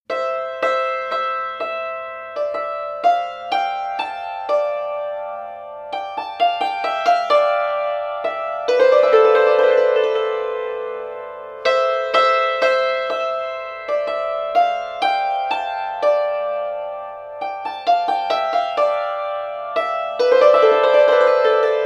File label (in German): Hackbrett Mascarata